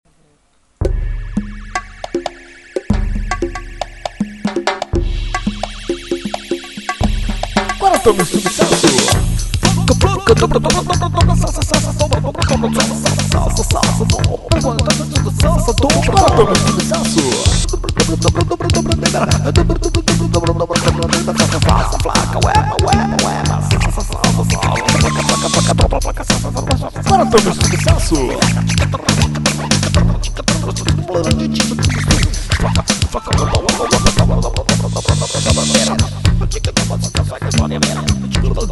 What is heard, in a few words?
Salsa